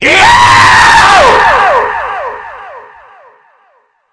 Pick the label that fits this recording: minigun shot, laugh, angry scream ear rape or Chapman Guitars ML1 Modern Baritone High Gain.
angry scream ear rape